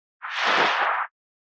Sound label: growl; a ghost n haunt